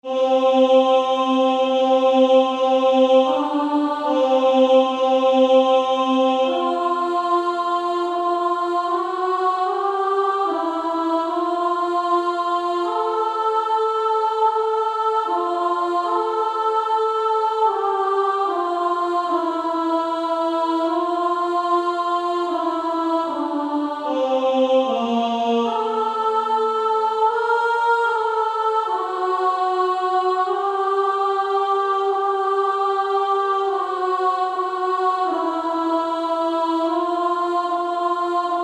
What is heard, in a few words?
Female Choir